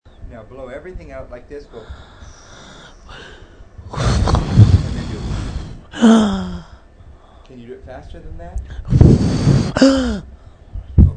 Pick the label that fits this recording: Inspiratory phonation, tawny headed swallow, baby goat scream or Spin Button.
Inspiratory phonation